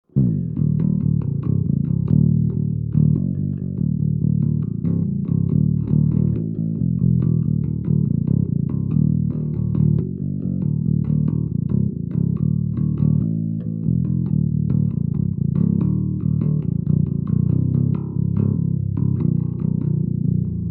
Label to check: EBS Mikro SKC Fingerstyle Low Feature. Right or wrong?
Right